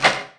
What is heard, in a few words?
DOOR OPEN; shogo; doors